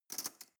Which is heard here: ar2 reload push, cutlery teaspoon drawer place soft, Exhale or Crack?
cutlery teaspoon drawer place soft